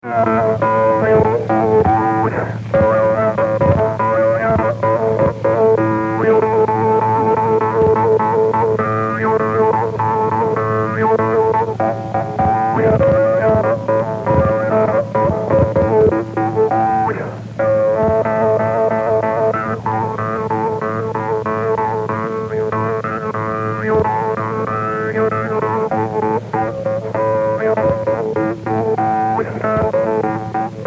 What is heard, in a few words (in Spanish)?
Toque de trompe; Música Mapuche